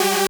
synth

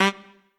Trumpet melo

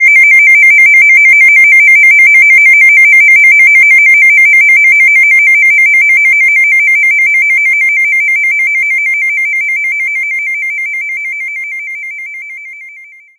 SE MAP MOVE BOOST; maimai

